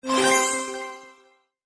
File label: unlocked; Wreck-It Ralph